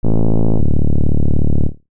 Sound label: game over